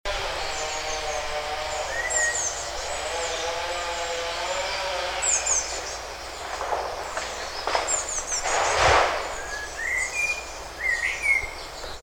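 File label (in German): Baumfällung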